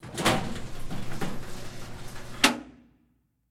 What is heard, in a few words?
Meh Door Open; Game rip; MiSide